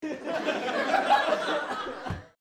Crowd-Small Group Laugh; Small Crowd, Mixed Group Of Men And Women Laugh; Laughter; Voice

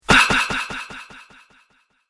Body Blow Punch Ahh With Fast Echo; Punch And Hit Sound Effects; Human Body Sound Effect

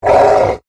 Monster Grunt, Horror, Monster, Grunt, Creature

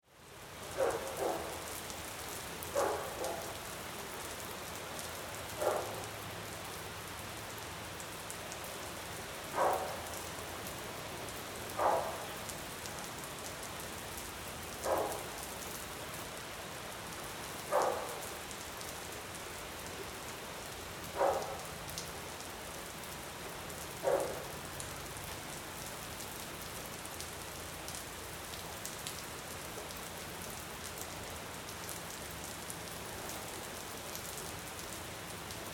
Weather Rain Pour Neighborhood Ambience

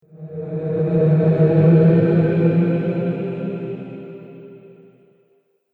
Ghost Of The Forest; Ghost Sound Effects; Andrew Fleming; Twistedtracks

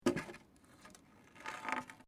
WOOD PLANK MOVEMENT

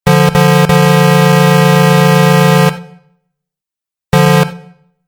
Truck Horn Two Sounds; Truck Sound Effects

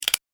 Stapler One Press; Stapler Sound Effects; office

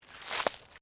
FOOTSTEPS THONGS (FLIPFLOPS) GRASS LEAVES STEP

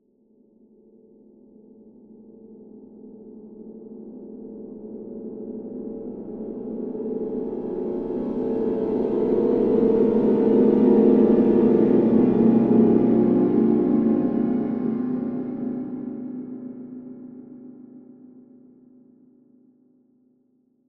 Horror Metallic, Swells, Eerie; Production, Element, Low

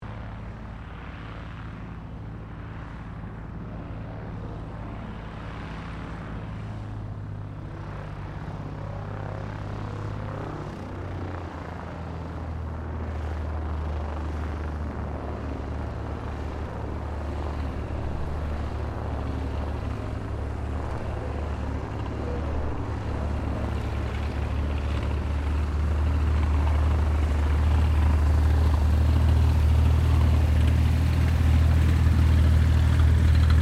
AIRCRAFT CESSNA O-1 BIRDDOG TAXI SHUTDOWN; Cessna Birddog spotter aircraft; Airplanes (Sound Effects:Vehicles)

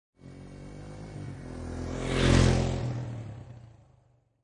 Motorcycle Dirt Bike Drive By Left To Right; Motorcycle motor. Motorbike type offroad Dirt Bike

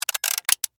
Lever Mechanical, Machinery; Machines; Blastwave

